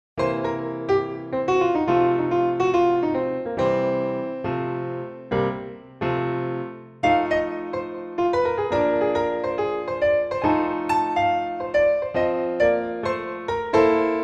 blues Piano seul; Jazz

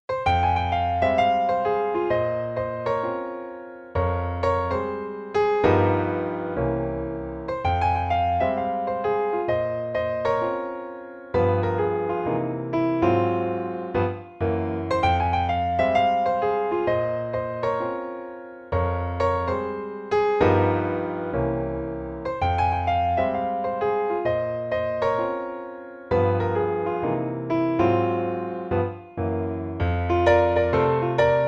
Swing Piano seul; Jazz

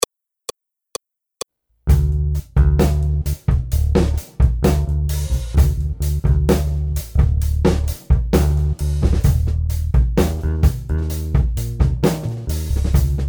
Modern Binary Jazz, Accompagnement seul

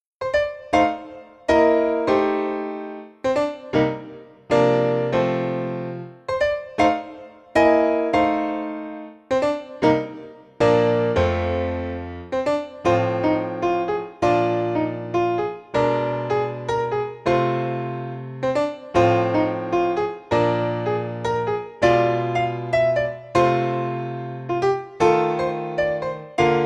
20 Bars blues Piano seul; Jazz

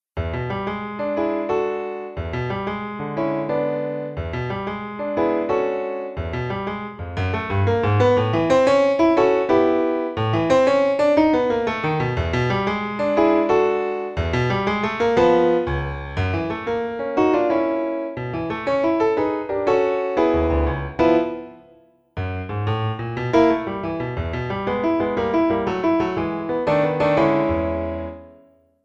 Left hand blues Piano seul; Jazz

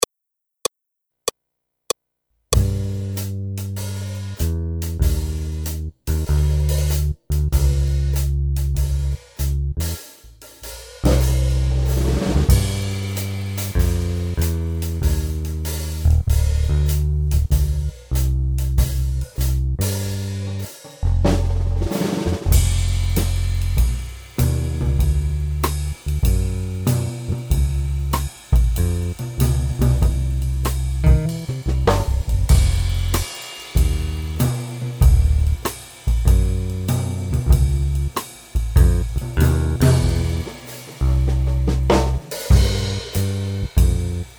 Minor mood Accompagnement seul; Jazz

